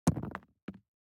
DICE on Neoprene, Throw and Roll, Metal, 2 Two Dice; Samples; ArticulatedSounds; DICE Sfx Party (Extended)